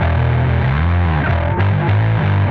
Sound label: BD Fuzz Bass